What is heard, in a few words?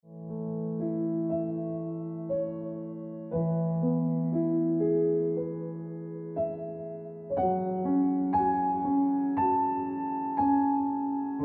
Piano Music